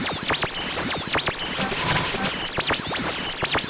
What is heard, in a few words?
Radio Noise, radio tuning noises